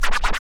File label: FX SCRA, Scratchin; Club-50 - Foundations Roland; Scratch 1 S